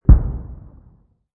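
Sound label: explosion distant; modern warfare 2; amb elements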